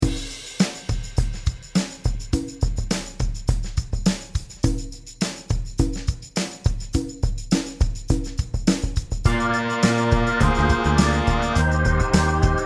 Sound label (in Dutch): Snel